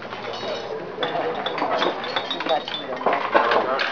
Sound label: House Sound; yoot; HawaianCondo